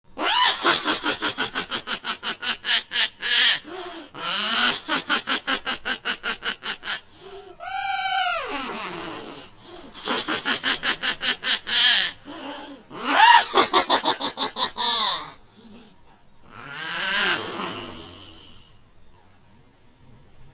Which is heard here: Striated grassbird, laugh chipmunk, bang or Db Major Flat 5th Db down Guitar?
laugh chipmunk